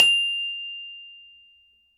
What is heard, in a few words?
start; Word-Connect